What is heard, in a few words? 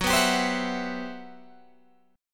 G Major 13th